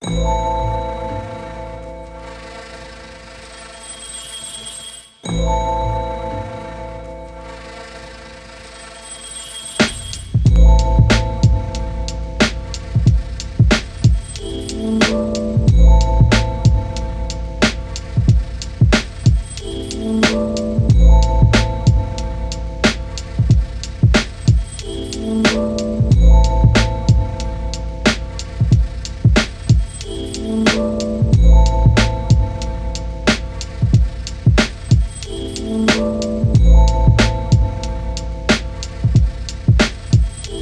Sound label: Bamboo Outro